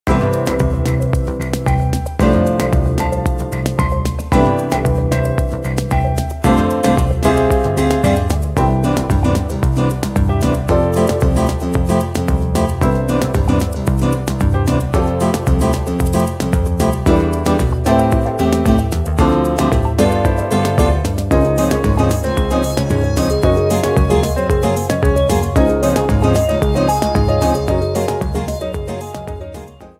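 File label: Underwater theme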